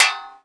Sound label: Bullet Tray; TR3; Playable Tutorial Level